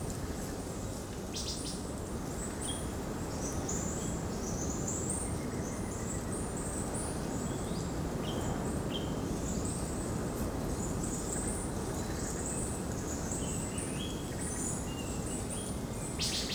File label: ambience desert forest day breeze dry; Rust